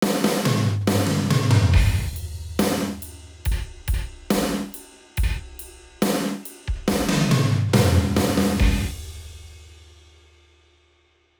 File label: Steel Ring Reverb Gate